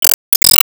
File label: Enemy Train Light On